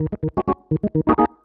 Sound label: ORGN 123, Keys; Houseworx